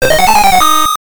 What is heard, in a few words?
Game Paused; GB